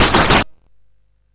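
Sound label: Nebulus, MOS 8580 SID chip music, games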